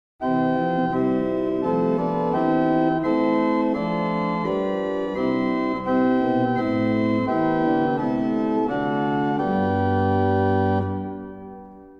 Montre Prestant; Mainz

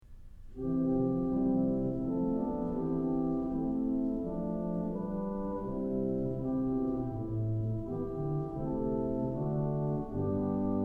French Horn, organ stops; Kellogg Auditorium

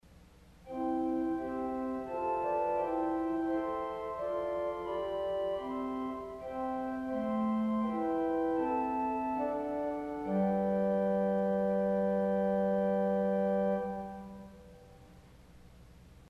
Flute Triangulaire 4 St Anne, organ stop; CulverAcademy

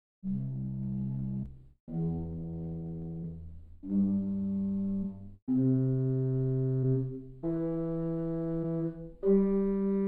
Gt Principal8 arp, organstops

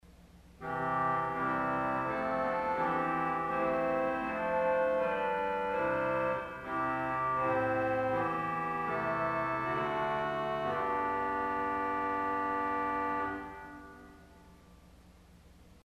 Clarinet St Anne, organ stop; CulverAcademy

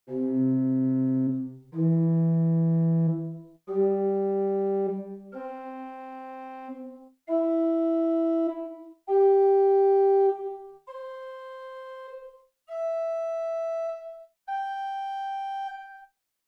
Ped Choralbass4 arp, organstops

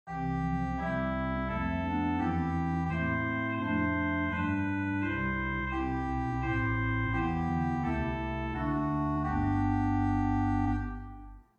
Oboe solo, organ stops; Swell: Oboe 8' Great: Lieblich Gedact 8' Pedal: Echo Bass 16', Great to Pedal 8'; St. Anne; Hauptwerk; St Annes Moseley

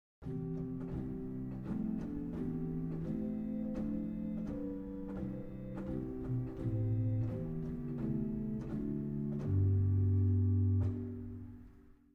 Cor De Nuit, organ stops; CavColMainz

